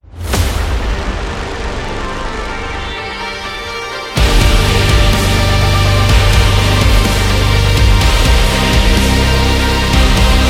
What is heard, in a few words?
Modern Epic Metal